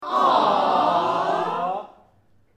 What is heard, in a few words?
group aww, Crowd Aww; Small Indoor Crowd , Mixed Group Of Men And Women Say " Aww ."; Exclamations; Voice